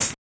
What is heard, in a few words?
house hihat open